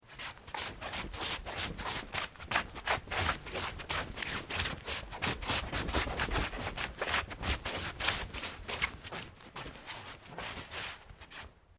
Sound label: FOOTSTEPS TABI SLIDING TATAMI MATS SEQUENCE; Footstep Foley; Human